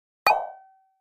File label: Pop Up High Guitar Str, pop up element